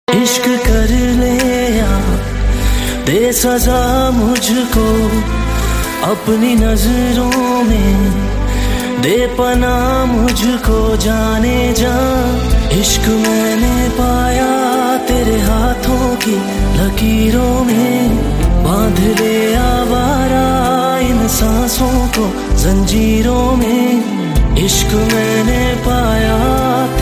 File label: Hindi Love Song Ringtone